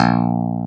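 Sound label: clavinet; Roland LCDP10 Keys of the 60s and 70s 2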